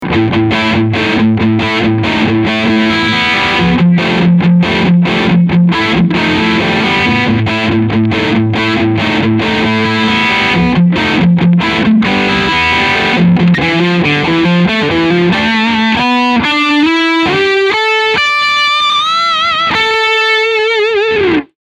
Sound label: High Gain